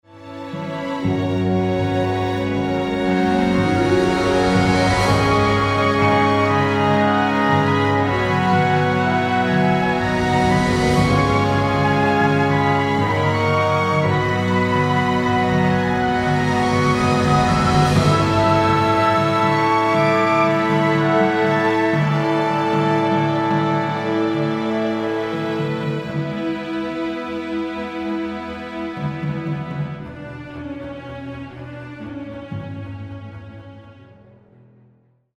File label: Our World instrumental